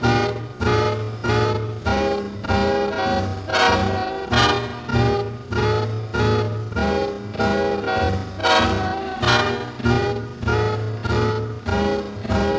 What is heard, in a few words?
swing